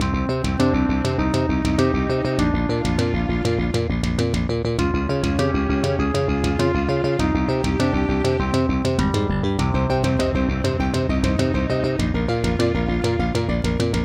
Casio LD-80; Hocus Pocus

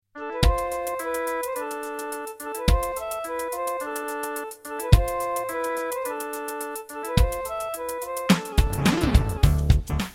Casio GZ-50M; Lost Vikings

